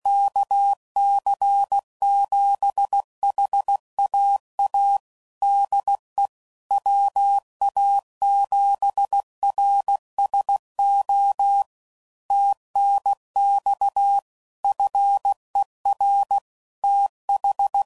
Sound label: CW Code Groups 15WPM, QSOs; HamRadio; Morse Code; kc7haa